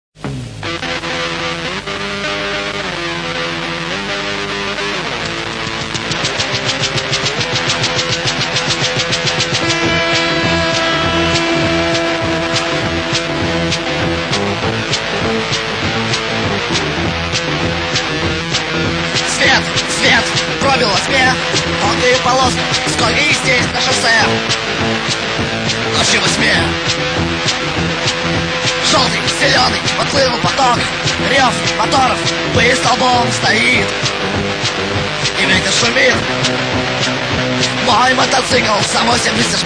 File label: Rocker